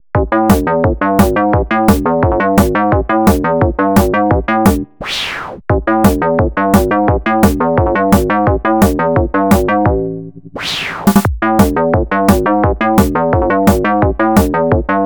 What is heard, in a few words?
synti fast; instanssi16